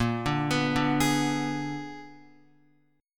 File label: Bb 7th Flat 9th Bb down Guitar; Bb7b9; arpeggiated; JGuitar